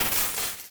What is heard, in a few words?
sfx skill, ZombieSkill SFX; IdleRPG2024